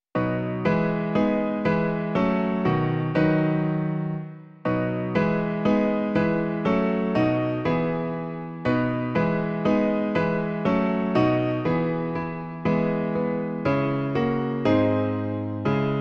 Bože Otče svatý, sešli svoje světlo - klavír; Klavírní verze

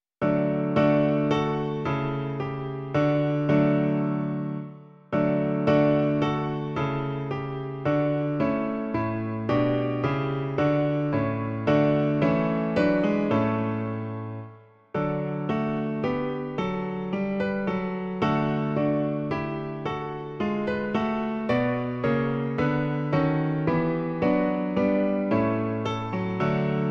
klavír; Ó Bože, vyslyš nás; Klavírní verze

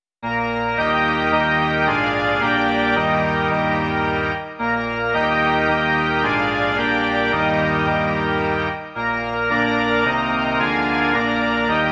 varhany

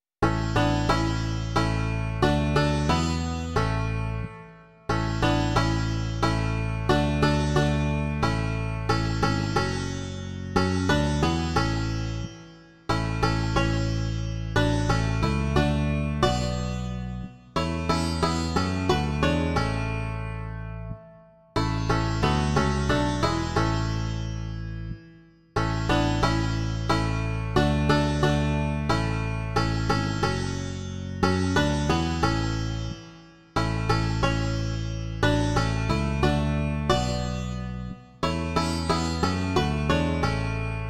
banjo, sitár a baskytara; Pod ochranou Nejvyššího; Imaginativní verze

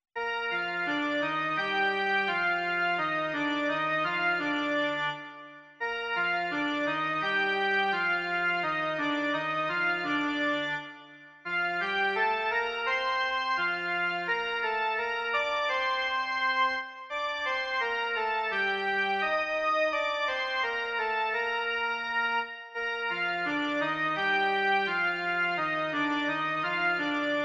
jen melodie; Kdo za pravdu hoří

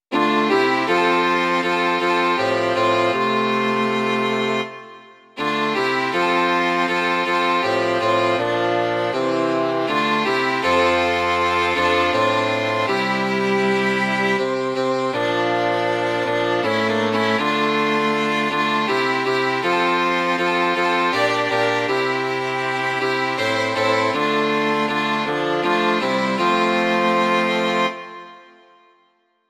smyčcové trio a fagot; Imaginativní verze

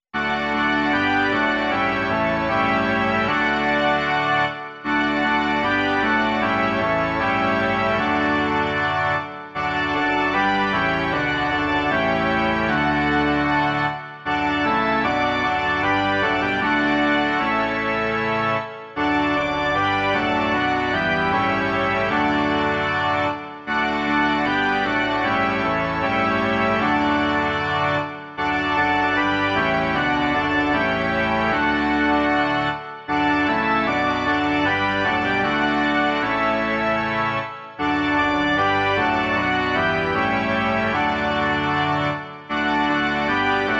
varhany; Povězte, přátelé malí

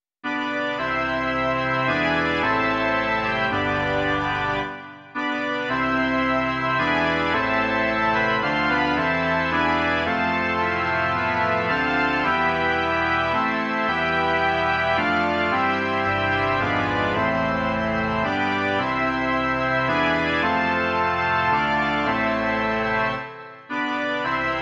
varhany; Syn Boží se nám narodil

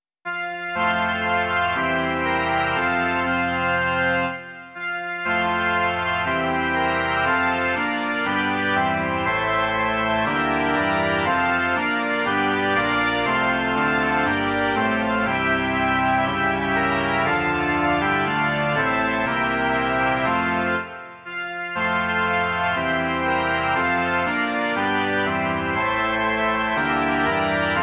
varhany; Kdo spatřil jasný hvězdný třpyt

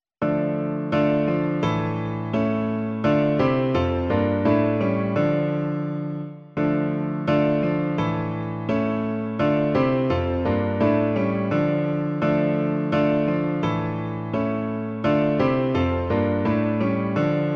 klavír; Velký Bože, my se Ti klaníme; Klavírní verze

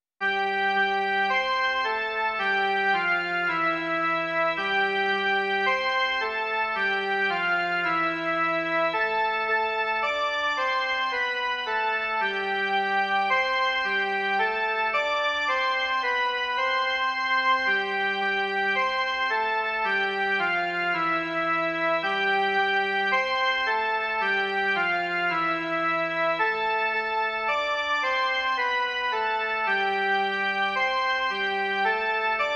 jen melodie; Pane Bože v nebesích

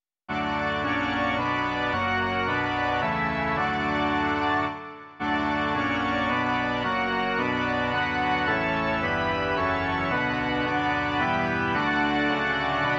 varhany

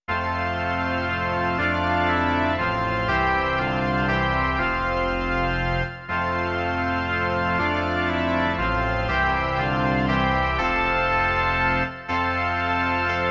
varhany a žesťový soubor; Hospodin, ten slavný král; Imaginativní verze

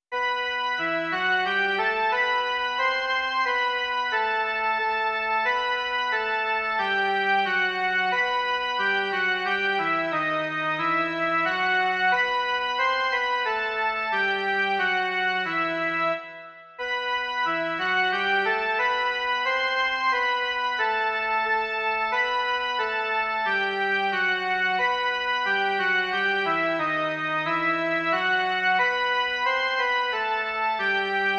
jen melodie; Aj, již se nám den nachýlil

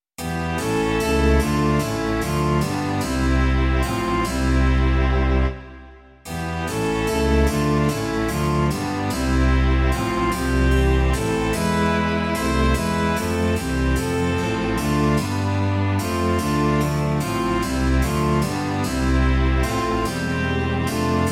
smyčcové kvarteto s bassem continuem; Navštiv nás, Kriste; Imaginativní verze

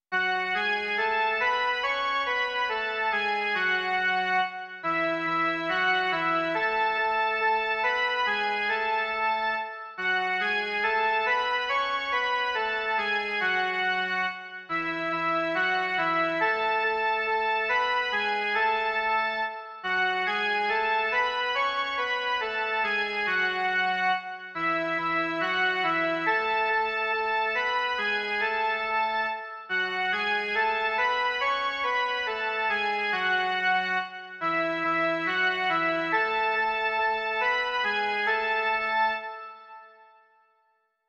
Blahoslavenství v době postní - jen melodie, Mužská verze; Doprovody Farského liturgie

